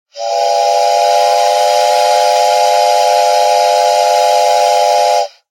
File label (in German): Dampfpfeiferl